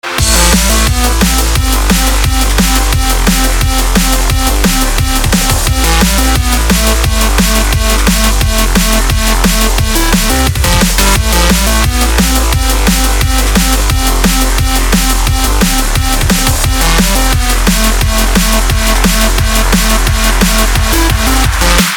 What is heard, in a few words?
space drum and bass